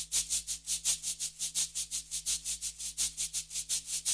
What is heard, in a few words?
Cabassas Accent Rhythm; Electa Quinney